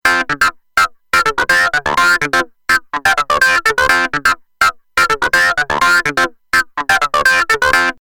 Sonic Farm Xcalibur Clavinet

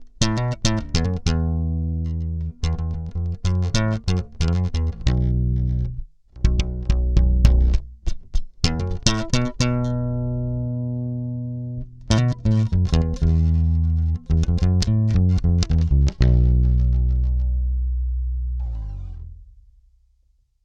E Bass Fingered

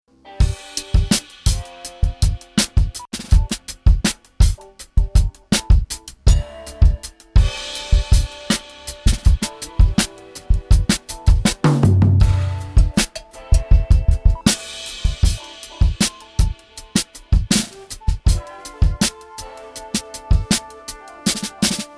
Golden Age EQ 81 MkIII Drums

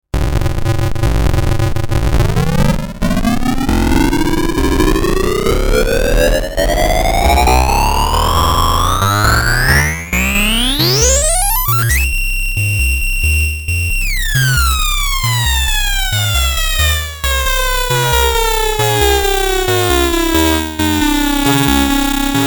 Bit Crush

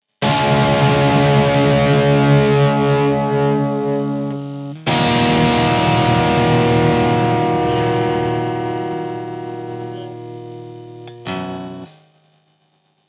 guitar amp american sound

